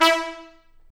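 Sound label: BRS TP STA, brass trumpet stabs; Roland L-CD702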